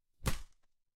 impact slam

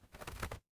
animal wing flap

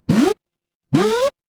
retro game jump

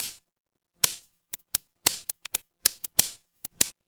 fire electric crackle

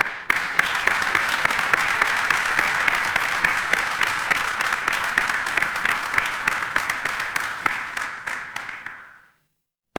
crowd applause

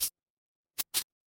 retro game coin

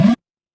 retro game coin

